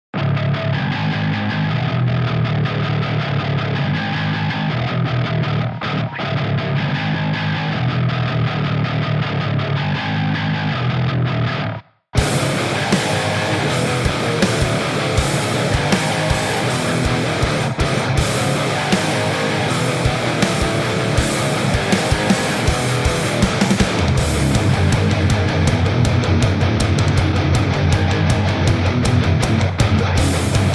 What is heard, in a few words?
drums; oldbellaudio